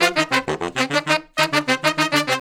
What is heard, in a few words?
Horn Section; Traditional; Sonic Foundry (Sony Creative Software); Jazz Solos and Sections